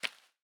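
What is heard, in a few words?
footstep concrete; The Swapper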